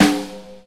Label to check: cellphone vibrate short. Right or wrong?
Wrong